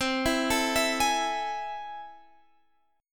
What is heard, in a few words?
A Minor Major 7th C, chord